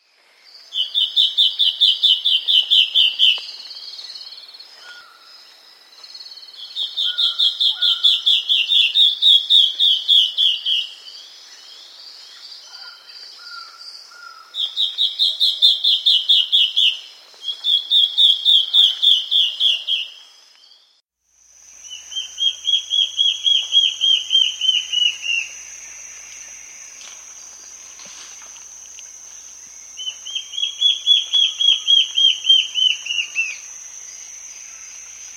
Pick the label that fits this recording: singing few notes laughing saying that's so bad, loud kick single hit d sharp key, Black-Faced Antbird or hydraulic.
Black-Faced Antbird